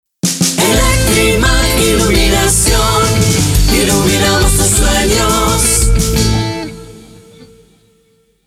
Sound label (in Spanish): Jingle Cantado; Electrimac Iluminacion